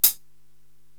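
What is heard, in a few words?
Hi Hat Closed; Korg TR-Rack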